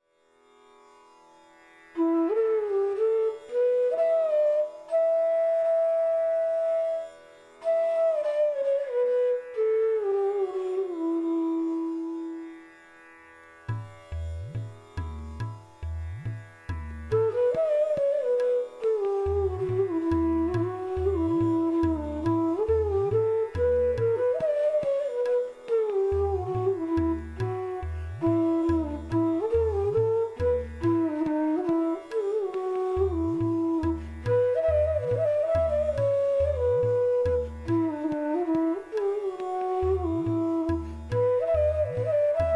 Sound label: Raga Bhimpalas Flute; Bansuri Student Zone